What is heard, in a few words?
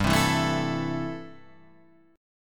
A-Minor 7th G